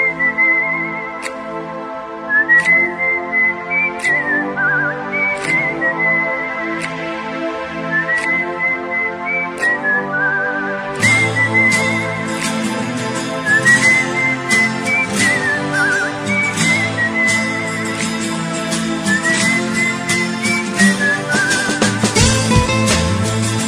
whistle mix guitar

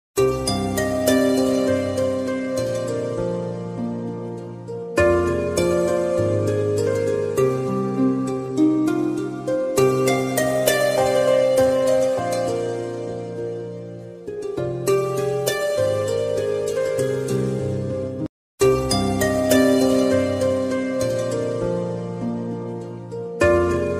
instrumental music ringtone

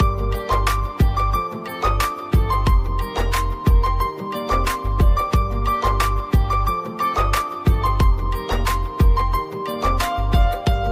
instrumental ringtone

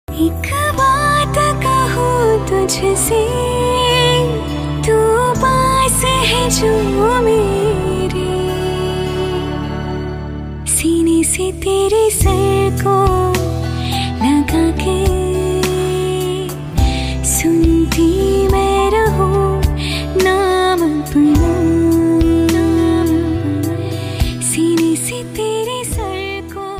female version ringtone

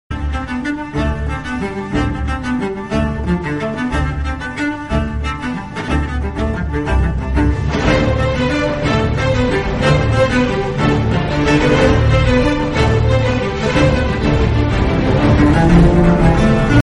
violin trumpet duet

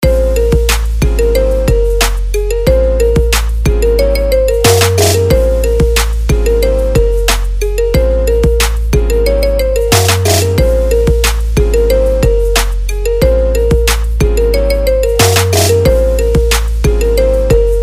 Instrumental

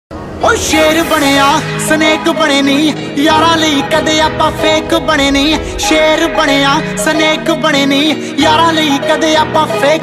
Punjabi Song

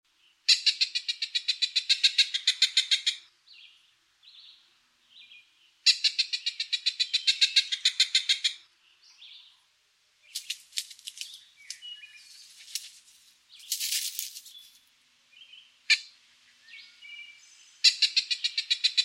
coopers hawk

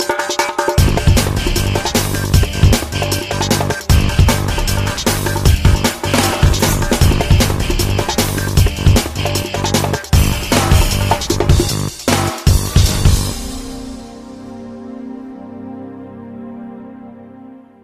locura instrumental

